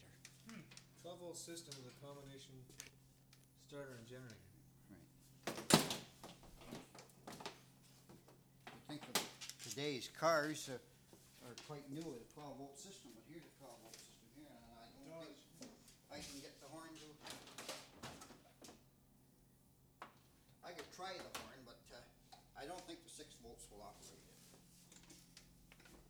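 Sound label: 1926 MODEL "T" FORD TOURING, ANTIQUE CAR HORNS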